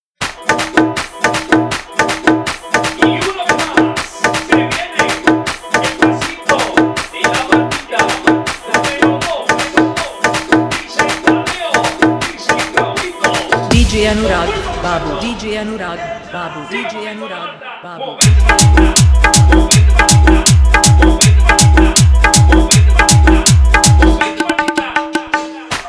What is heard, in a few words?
Jhan Jhan Bass Full Dance Mix